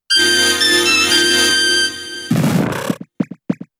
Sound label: Grass Whistle; pokemon sfx gen 7 attack moves sumo usum